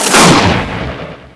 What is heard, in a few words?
THUNDER; AKAI S-Series CD-ROM Sound Library VOL-8; SFX